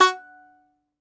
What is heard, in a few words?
Tenor Banjo, Plucked Strings